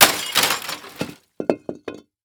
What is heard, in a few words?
Impact Cinder Block Drop On Broken Television